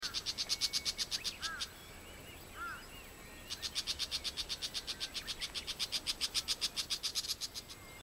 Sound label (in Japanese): akamozu, 野鳥の声; アカモズ; モズとアカモズでは大分見た目が違います。市街地では冬にモズ、春にアカモズとそれぞれ目にする時期が違う気もするのですが、このあたりは検証中です。